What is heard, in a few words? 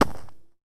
dirt, footsteps